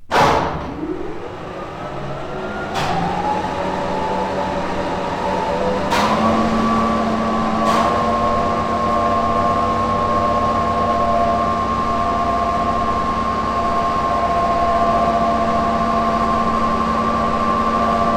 sewage pump

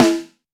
damped snare drum sample e key

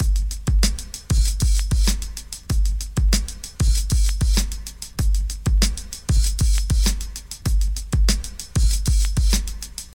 97 bpm modern drum beat g key

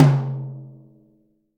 low mid tom one shot c key

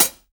clear foot cymbal B key; hat samples

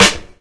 studio snare drum sample g key

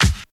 fresh raw snare one shot C sharp key; snare drum samples

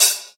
dark pedal hi hat sample f sharp; drum single hits one shots; hat samples

